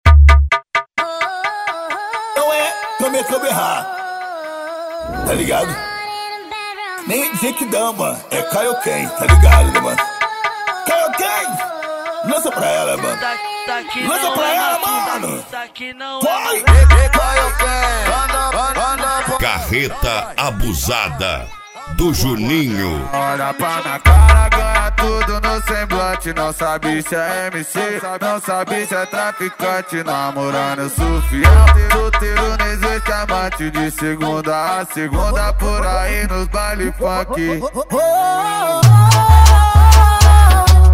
mega bass

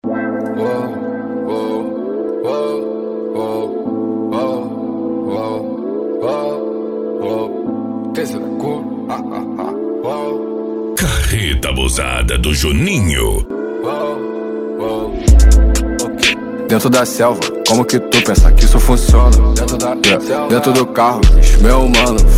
mega bass

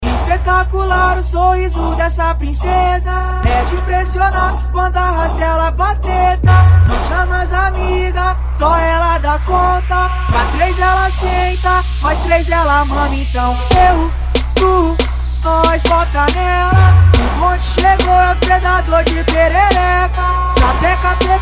trap funk

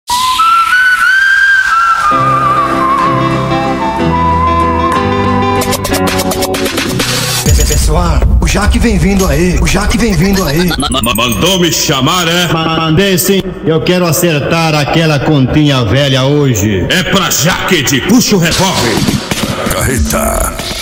extreme bass; carreta

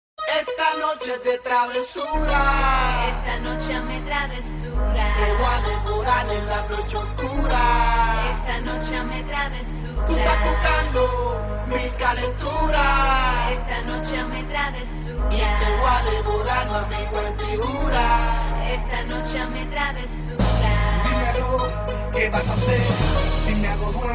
reggaeton mix; reggaeton mix evolution

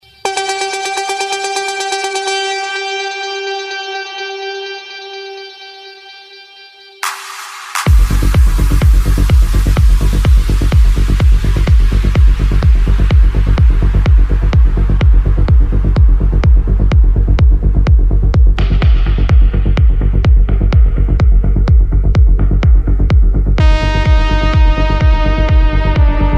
progressive techno house

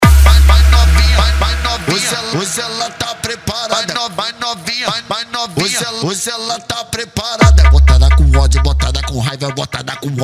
mega funk bass

